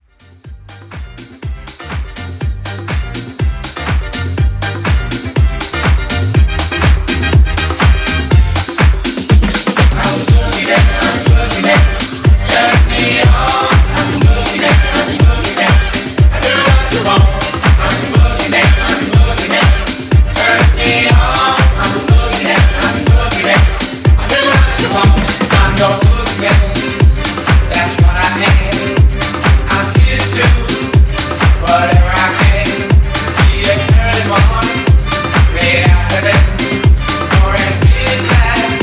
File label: Funky House; Remixfreakz